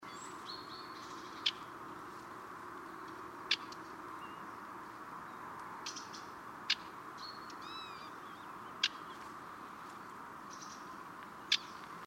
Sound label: Acrsci mono